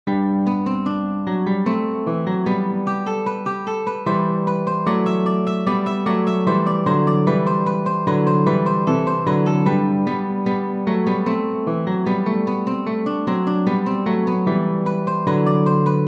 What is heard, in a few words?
variation for mandolin guitar; Johann Sebastian Bach; allein gott der sei ehr